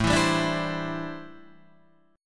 C Major Add 9th, A, guitar chord; strummed

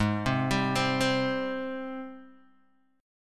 Ab Major 7th Ab down Guitar Open D

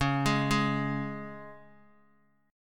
C sharp 5th C sharp, Guitar; arpeggiated; C#5; JGuitar

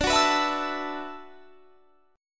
D 6th Add 9th D; D6add9 strummed; 22,21,21,21,22,24

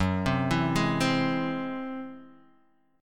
Ab Augmented 7th Gb, guitar

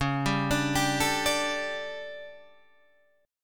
D Major 7th Suspended 4th, chord

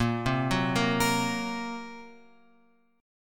A sharp Suspended 2nd Sharp 5th A sharp; A#sus2#5 Chord {6 3 4 3 X 6} arpeggiated

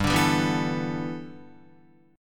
G 6th Add 9th G Guitar, chord; G6add9 strummed

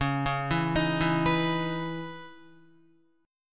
Db Minor 9th Db Guitar; arpeggiated; JGuitar

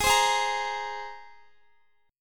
Ab Major Add 9th, Guitar; strummed; x,x,20,17,20,18; down; 4F,4A,5C,5G,5C,6E

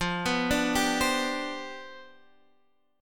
C Major 7th Suspended 2nd Suspended 4th F, chord

